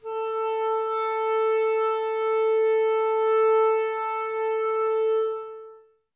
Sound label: clarinets, Wood Winds